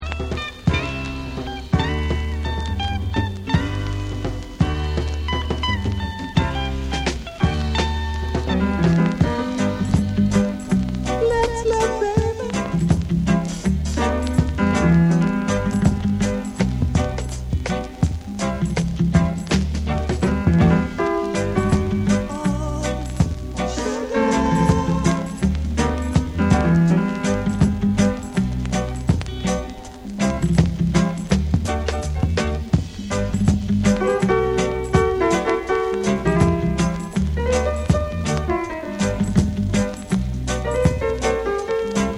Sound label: CLUB REGGAE